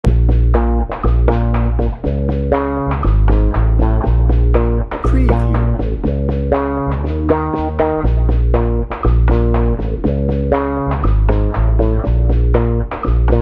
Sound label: bouncy bones dnb